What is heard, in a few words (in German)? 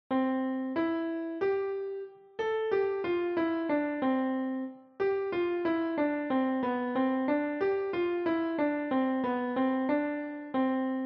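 ABC die Katze lief im Schnee Klavier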